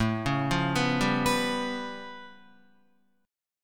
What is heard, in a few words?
A Augmented 9th A, Guitar; arpeggiated; JGuitar; 4,4,5,4,7,7